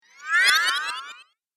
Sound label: Cartoon Game Spell Disappear; Magic Sound Effects